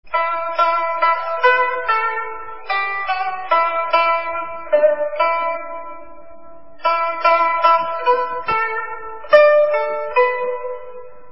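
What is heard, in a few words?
dan tranh without lyrics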